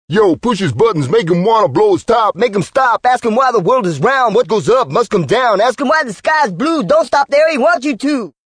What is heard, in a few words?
Freestyle Rap, Male, Rap, urban, w. FX, vocalization, (human voice); Singing; VoiceBox